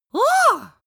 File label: outcry boy; vocals; comedy-cartoon; soundpool